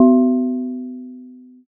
gong

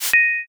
sent

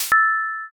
block clear

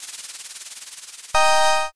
answer roll

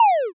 cast cancel

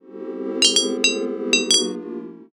tavern

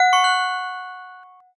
gamelan

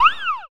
monster death

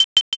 scissors

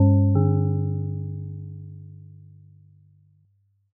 china gong

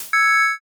reveal

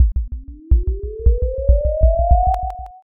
pulse

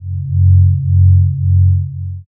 space drone

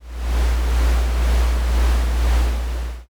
heavy machine

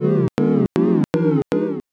laugh crowd

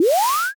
zoom

